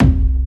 Subby Kick C Key, Royality free bass drum single hit tuned to the C note.